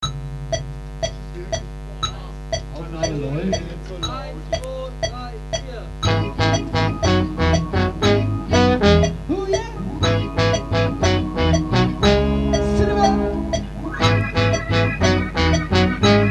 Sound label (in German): ohne Schlagzeug 120